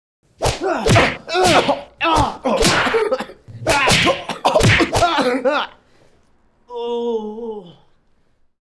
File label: Comic Comical Beatdown Hit Punch Ouch Ow Painful Yelling Cheesy Cartoonish